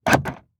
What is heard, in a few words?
Saturn Door Lock Interior Fast; Auto; Transportation